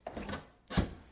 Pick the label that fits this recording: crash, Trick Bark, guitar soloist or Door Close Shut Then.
Door Close Shut Then